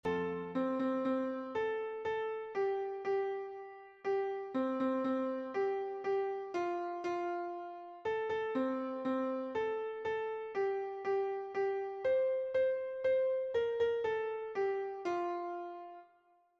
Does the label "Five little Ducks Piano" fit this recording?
Yes